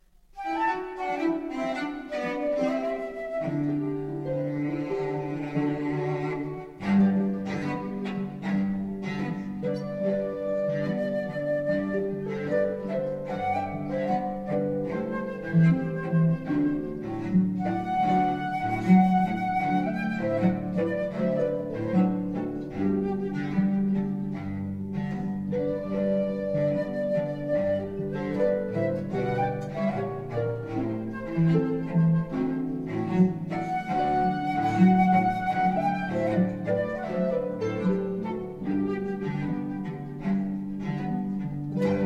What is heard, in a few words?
harp cello flute; La Paloma